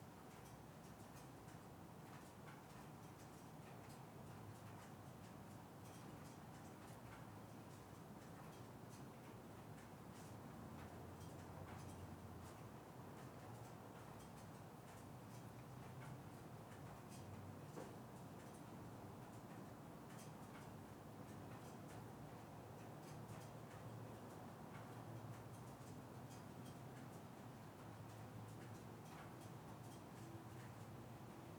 Room Tone, 2 Car Garage Ambience Car Pings; Blackmagic Fairlight

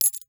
Coins Movement Counting; Foley

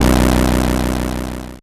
Title Transition Delay Short

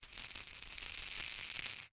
Household Rattle Shake

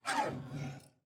Household Paper Cutter Blade Pulled Up

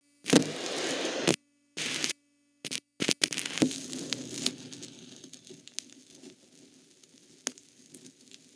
needle start, Vinyl; Cineblur

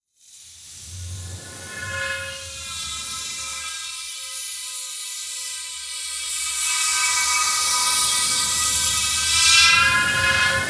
Horror Scary Wind Through Pipe Creepy

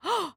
Human Female Short Gasp

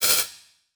Multimedia Internet CD-Rom Flash Element

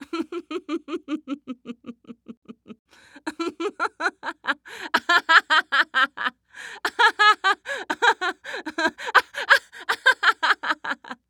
Human Female Laugh Long

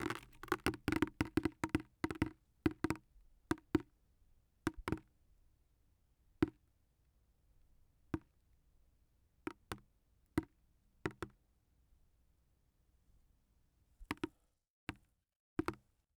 Liquid Water Drops Into Empty Bucket

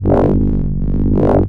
Science Fiction Sci-Fi Electronic Power Surge

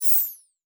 Multimedia Internet CD-Rom Flash Window Close

